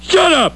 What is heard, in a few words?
pain; quake2; homer1 4